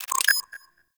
Animal Lure Call Success; Warframe; Venus Animal Lure

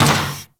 Moa Combined Step Default, Footsteps; Warframe

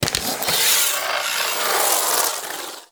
Equip; Weapons; Warframe; TheNewWar; Omicrus

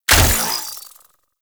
Derelict Prime Full Draw Shot; Infested; Cernos; Weapons

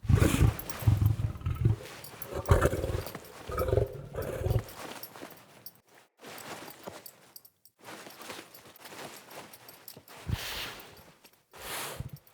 Wolf Idle Petting Lying Down, Vocals; Animals

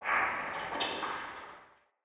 Fort Corner Tower Stone Creak; Ambience

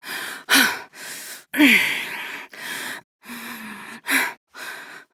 Breaths; Aoi; Warframe; NineteenNinetyNine; Stage8ShitboxConvoy

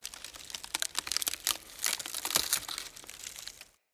Under City Tree Root Bark Crackle Slow; Ambience

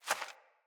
Rat Guide Step, Foley

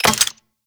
Flame Spear Charge; Warframe; Grineer

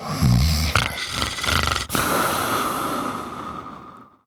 Snores, Son, Vocalizations; Warframe; Deimos

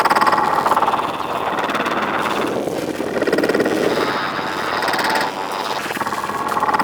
Exterior Lighthouse Loop; Warframe; RailJack; Ambience

